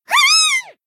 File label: combo break